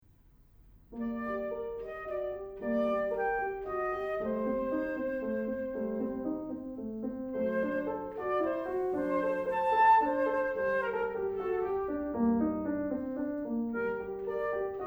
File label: Flute And piano; chamber-music-duo; J.S.Bach Sonata in E flat Siciliana; Flute Salad